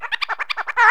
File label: SCRAT; E-Lab; X Static Goldmine 2; akai